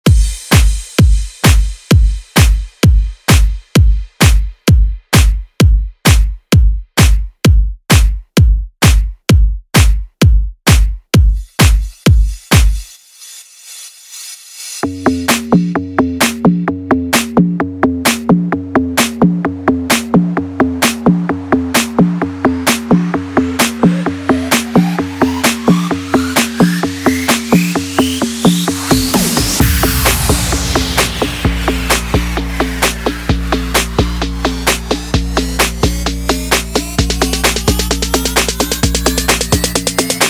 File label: instrumental